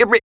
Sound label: Beatbox; neuro; Sinistarr